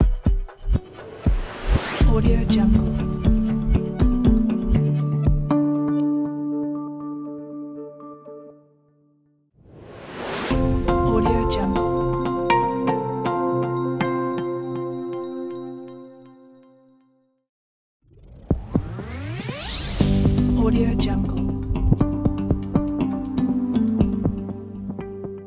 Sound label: it logo pack